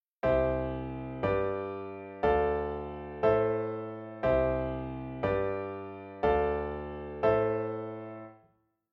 Syntonic Comma Pump 5 Limit Just

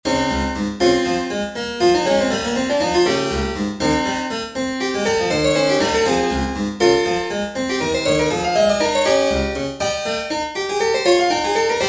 Diasem Lydian Example 14edo

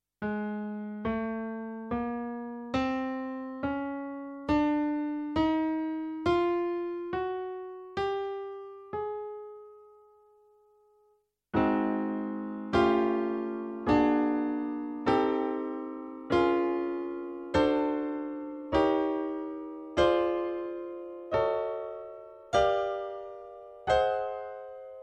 Pajara standard pentachord major 22edo

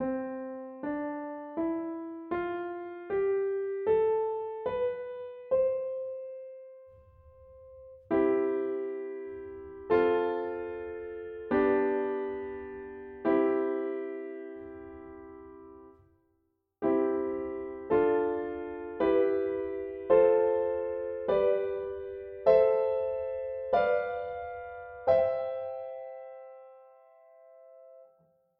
12edo Diatonic

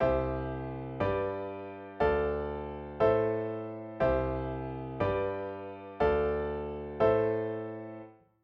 Syntonic Comma Pump 12edo

